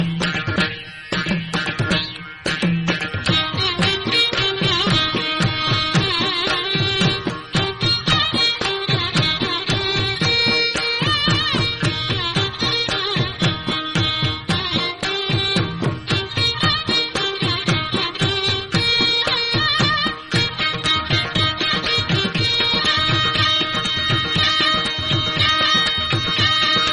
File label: violin